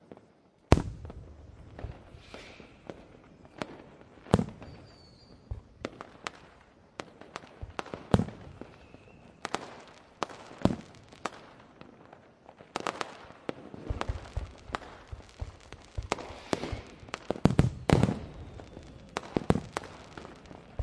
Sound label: fireworks